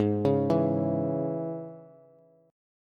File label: Ab Suspended 4th Sharp 5th, down, Bass; arpeggiated; JGuitar